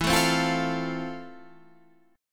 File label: F Major, guitar chord